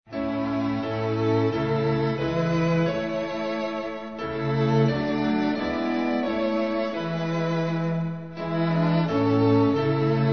how wondrous and great instrumental; hymns french

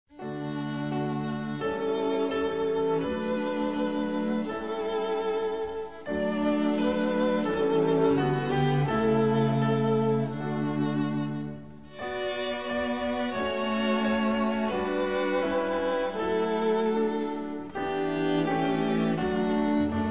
gods daily care instrumental; hymns french

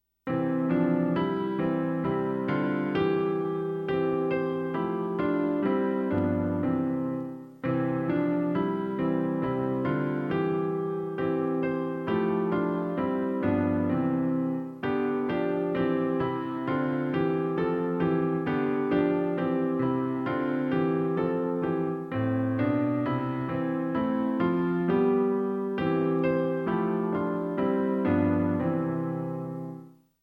music only; french; childrens songbook

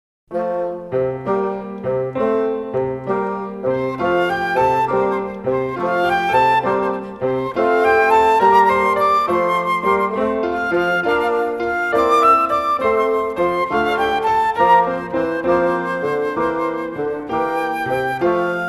music only; portuguese; childrens songbook

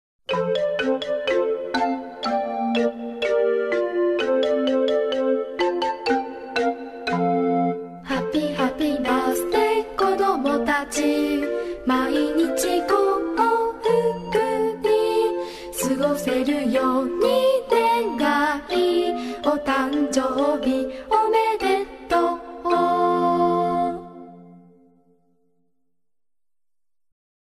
words and music, japanese; childrens songbook

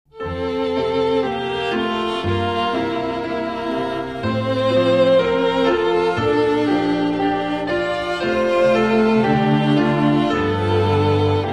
instrumental, hymns; hymns spanish

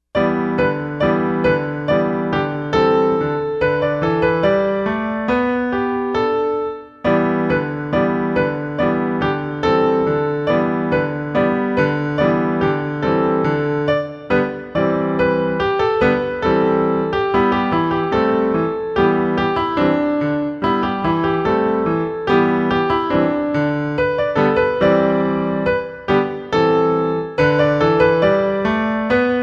music only; childrens songbook; Chinese

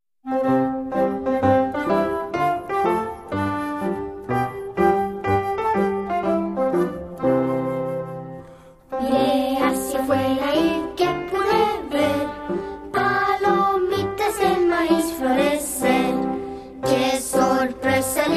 words and music, childrens songbook spanish; popcorn popping

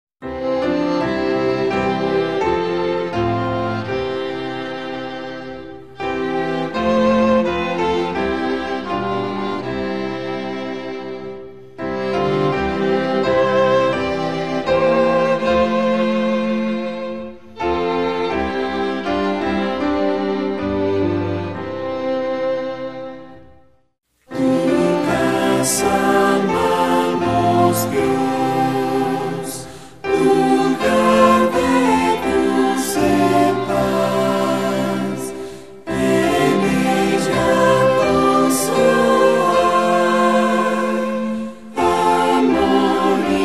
vocal and instrumental; hymns spanish

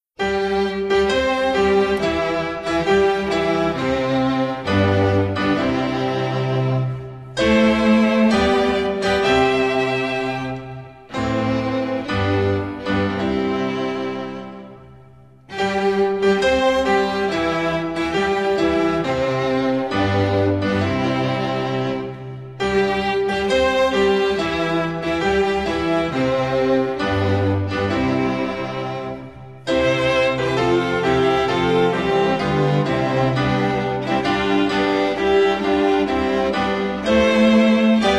instrumental; hymns spanish

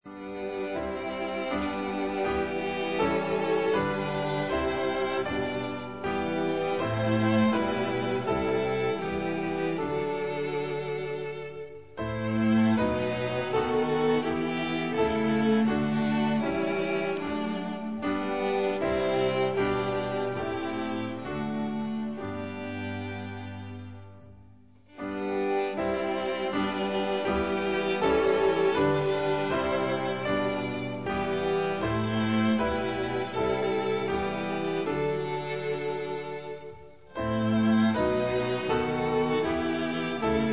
upon the cross of calvary instrumental; hymns french

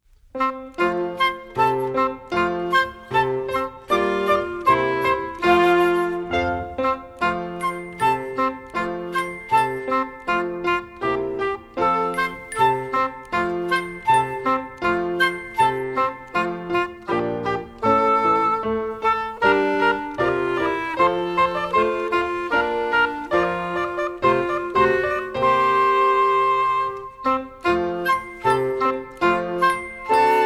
hello song music only; childrens songbook

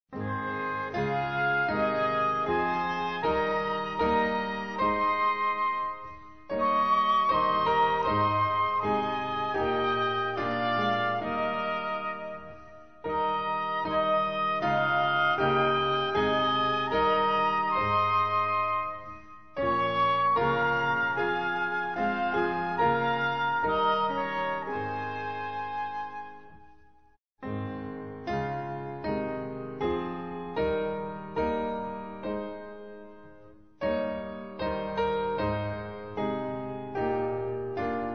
god of power god of right instrumental; hymns french

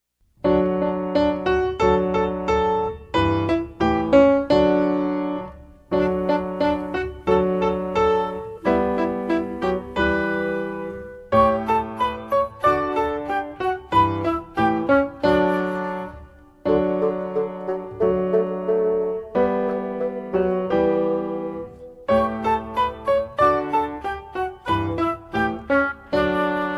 music only; childrens songbook; Chinese

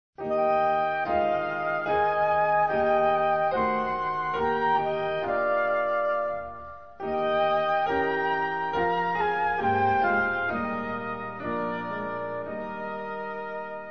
softly now the light of day instrumental; hymns portuguese

